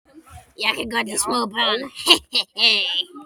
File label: ahhhhhh